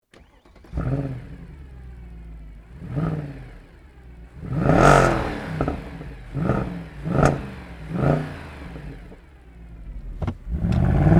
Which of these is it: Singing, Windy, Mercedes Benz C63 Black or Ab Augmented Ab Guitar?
Mercedes Benz C63 Black